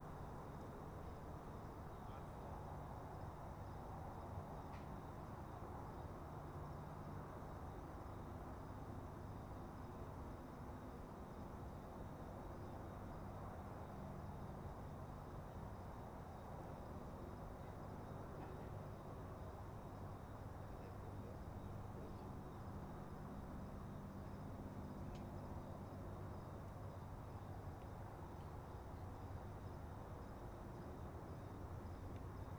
Transito bem longe rua noroeste a noite com moto longe e grilos

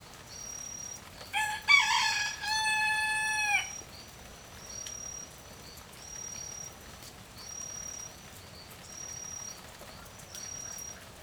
Galo canta agudo na chuva fraca, grilo e passaros longe; aves

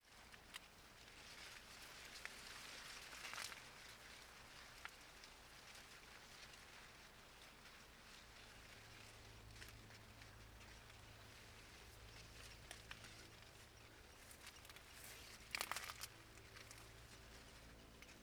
Vento com folhas de sorvo microfone no meio da plantacao

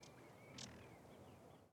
Passaro Pequeno da Rasante Rapido em Frente ao Microfone Estereo; aves; Coleção Sonora do Cerrado

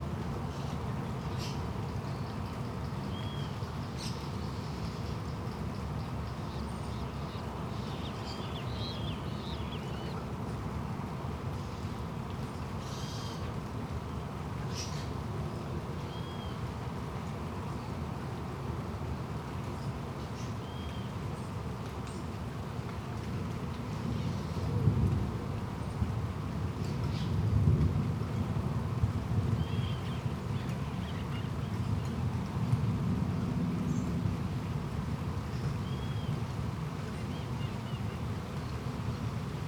Chuva leve com trovao, passaros e transito longe

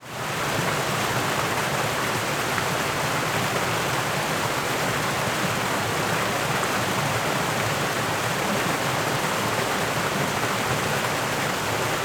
Pequena Queda Dagua em epoca de chuva no Parque Nacional da Chapada dos Ve; Parque Nacional da Chapada dos Veadeiros

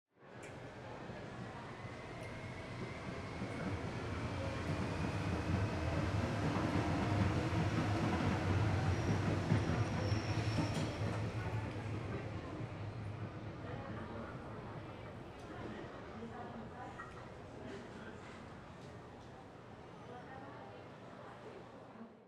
Metro Saindo da Estacao da Feira do Guara; maquinas; colecao sonora do cerrado

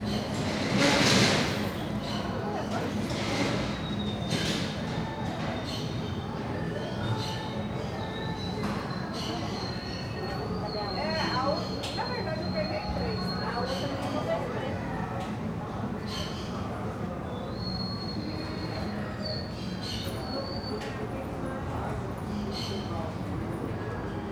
Ambiencia mercado goiania a tarde poucas pessoas, musica longe; portao no inicio

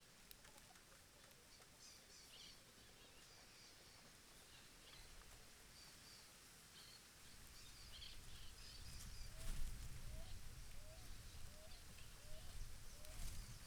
Ventando suave nas arvores em ambiente rural com passaros, passos na grama; e pessoa

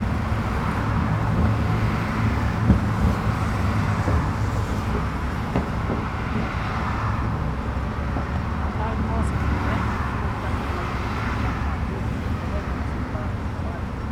Ambiencia dentro do carro drive thru janela abre e fecha muito transito; maquinas; canais-stereo

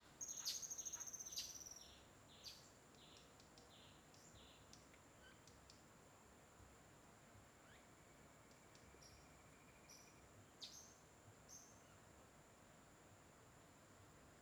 Beira do rio com alguns passaros e estalos Tocantins

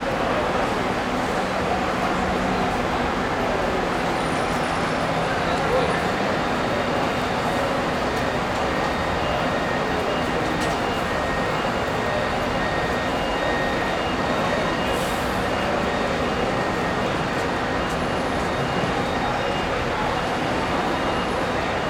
Ambiencia rodoviaria do plano piloto perto dos onibus, onibus dando re

